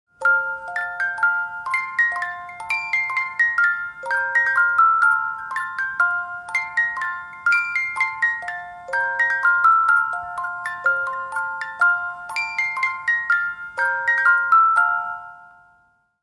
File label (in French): symphonie des jouets, boites musique